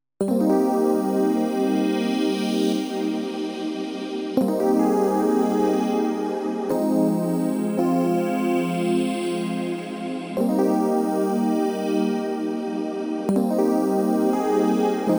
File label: Tone2 Icarus